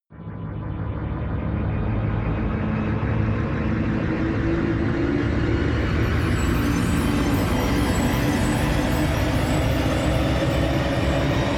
jet flyby, risers

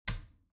bathroom cabinet warped close soft; objekte; tueren

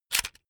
electric drill template slide out fast; maschinen; bohrer; soundpool

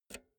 pull out jack multi socket quick; objekte; elektronik

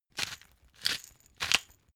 glass pepper grind sequence; berufe; gastronomie; soundpool

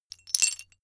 metal pieces clatter short

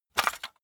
cassette case open hard; objekte; kassette

